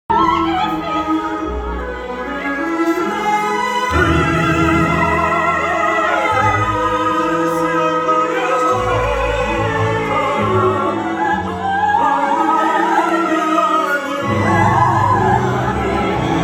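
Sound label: theatre music applause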